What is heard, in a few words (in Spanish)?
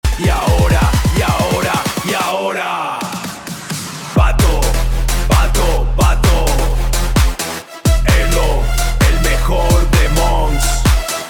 vato tecno